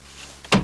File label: window slide